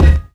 physics cube md; Portal 2

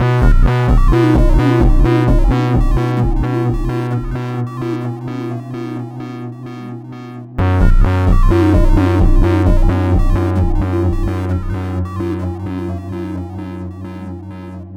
music; Portal 2; sp a2 laser chaining

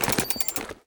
briefcase unlocking; Counter Strike Model Pack; CS:GO; survival

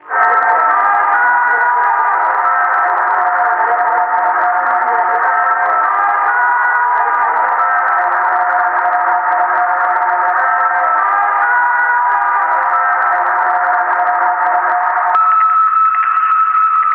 radio processed; c17

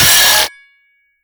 manhack grind; Half Life Alyx

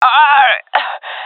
death, swat; vo; Counter Strike Model Pack; CS:GO; swat epic

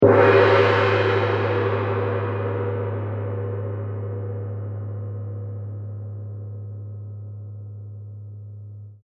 deathcam

